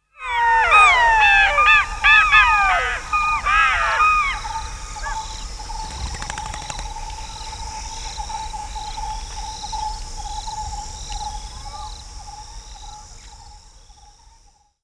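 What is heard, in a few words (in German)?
Goldschakal Geräusche, Wildtiere in Europa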